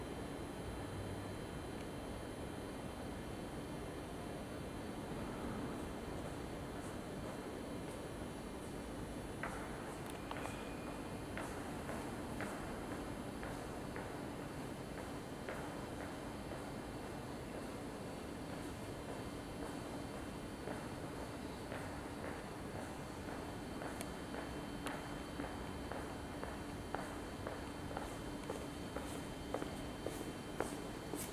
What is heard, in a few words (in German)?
darmstadt hbf schritte